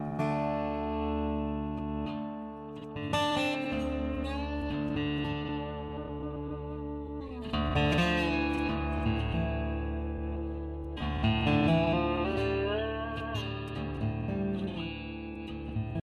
blues Guitar

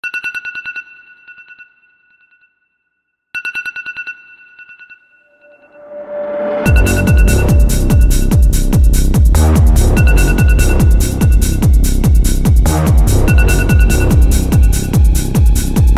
Alarm Clock iPhone Alarm Techno Remix; tiktok

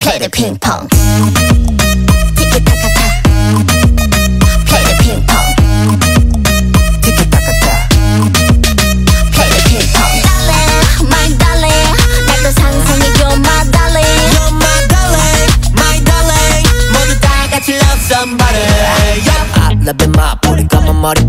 remix kpop

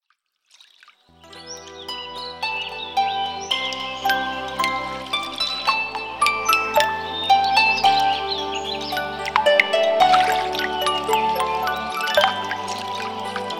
samsung cool note

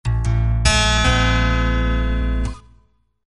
oppo reno11 pro sms

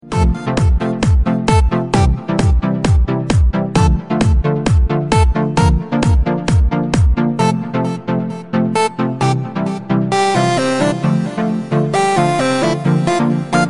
samsung cool remix, nhạc chuông

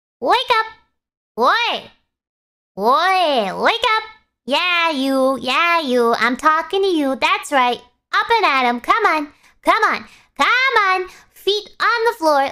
alarm; bao thuc gura

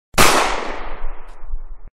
gunshot sound effect single shot

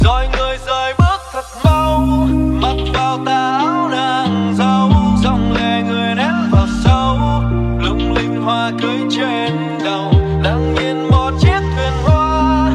Lofi

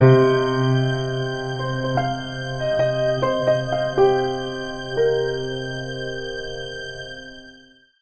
samsung galaxy j2 prime sms

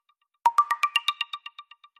nhac chuong sony ericsson

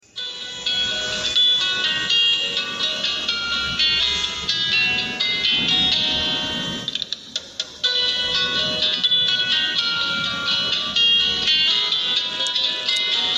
oppo a2 pro

